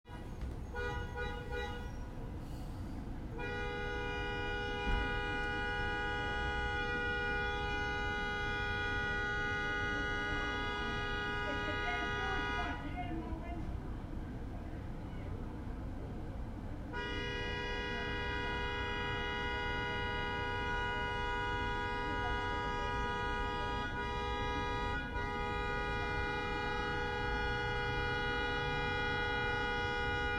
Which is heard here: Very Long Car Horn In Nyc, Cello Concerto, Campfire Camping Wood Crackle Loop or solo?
Very Long Car Horn In Nyc